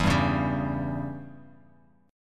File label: E Minor 6th chord; Em6 strummed; JGuitar